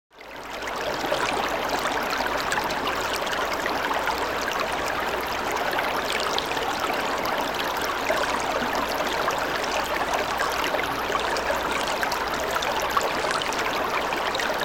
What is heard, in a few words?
Stream Small Gentle S, streams